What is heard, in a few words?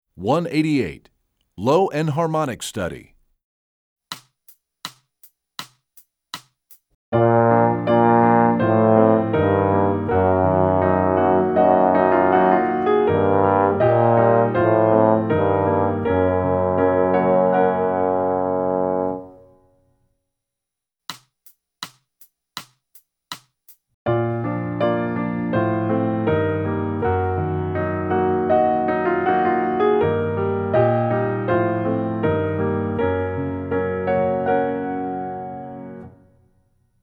Low Enharmonic Study, trombone; essential elements